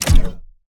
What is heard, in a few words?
Heartball Grab